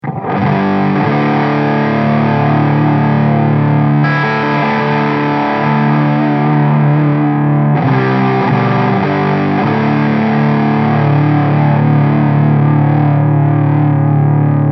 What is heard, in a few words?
MESA Boogie Mark I full gain